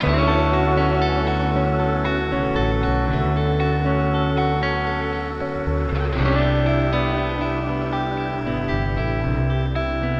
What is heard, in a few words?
78 BPM D Maj, guitar loops; Smoke Filled Room; Cymatics; Orchid Sample Pack